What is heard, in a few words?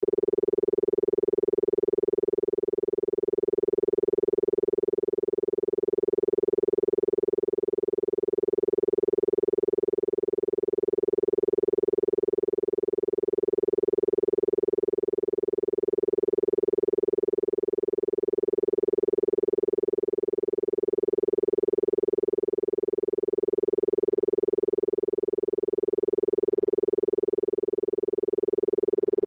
400Hz Isochronic Tone; 344 Audio; Mind States